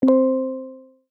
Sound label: point reached; Apple; iOS-18; VectorKit.axbundle